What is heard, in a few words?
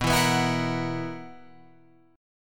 B Major 7th Suspended 4th B down Guitar Open F; BM7sus4; strummed